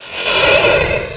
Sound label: lightsaber fly by, effects; Flyby Noise; extended noises